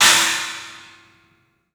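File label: CLUB CYMBALS; 3D Sound; 3D Drums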